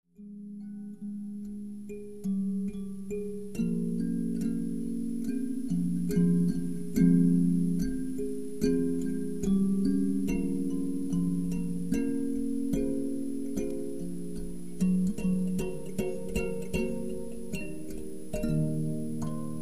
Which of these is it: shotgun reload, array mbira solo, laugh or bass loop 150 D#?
array mbira solo